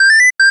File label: Flute; Yume Nikki